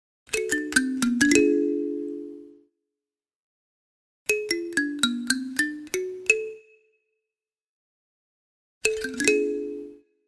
Thumb Piano, Ethnic African musical instrument Kalimba loop.; Miscellaneous Musical